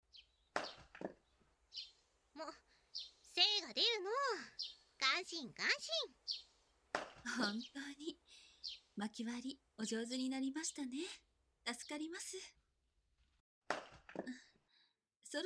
両耳舐め＆Ｗフェラチオ 効果音入り, ASMR; 神奉仕～両耳舐め＆Ｗフェラチオ～

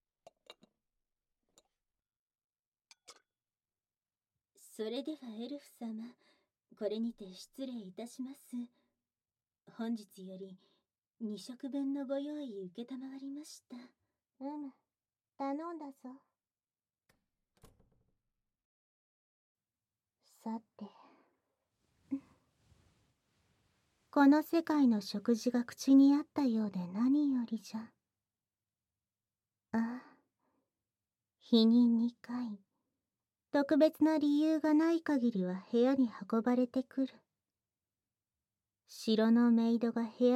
キス手コキ; 愛玩奴隷としての心得を覚える

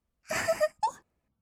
"あはっ", kawaii voice; 1200 kawaii voice!!

